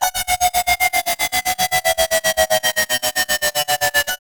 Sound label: fx fly bye